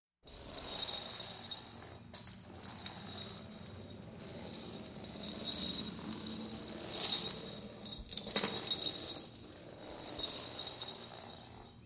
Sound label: Chain Dragging Slowly, Sounds Of Horror; Halloween; Halloween Sound Effects - Sounds Of Horror